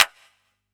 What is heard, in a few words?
clap, Konga; OpenTaiko; HitSounds